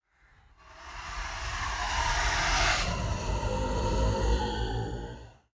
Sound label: Mannequin Scream